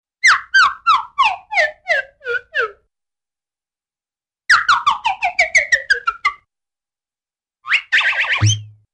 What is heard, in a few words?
Jerky Descending Whistles; Hollywood Edge Cartoon Trax